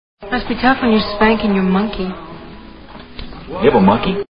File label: monkey; valve